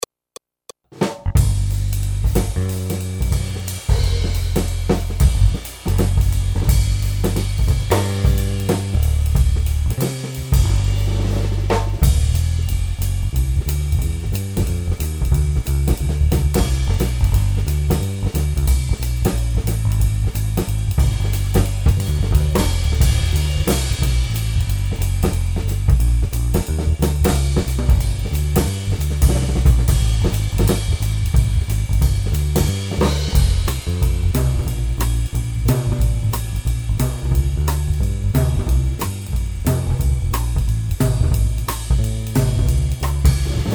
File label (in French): Accompagnement seul